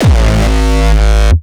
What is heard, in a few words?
kick; shock. Ultimate Kicks vol. 2